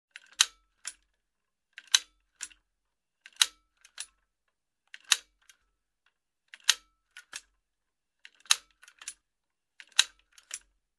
Steel Machine Joystick clank and ticks

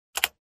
Computer Keyboard key press

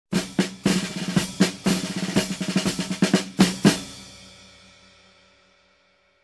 Marching Drums

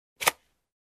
Play Cards Shuffling

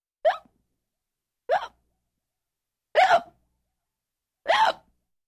Kid Hiccup

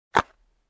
Case Close

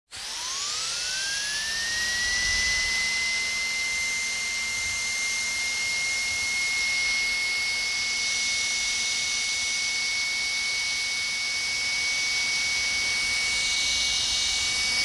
Drill at the dentist no treatment

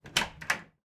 Door open

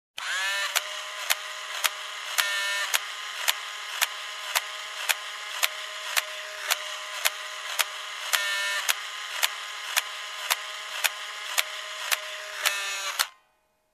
Polaroid Camera Printing Photo

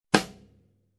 Single Snare hit

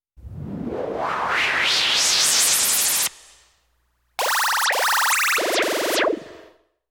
Long Beaming sound effect

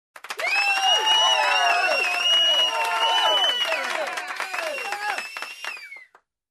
Group Cheers and Whistles

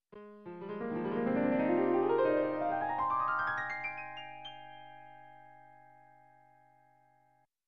Elegant Piano Scale Up

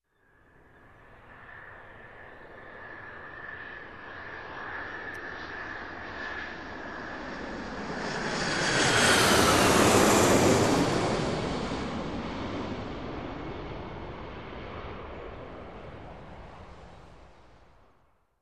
Jet aircraft flying over

